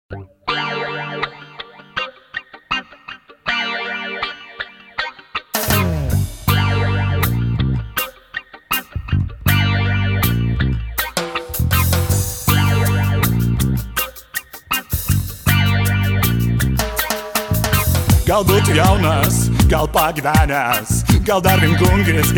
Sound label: reggae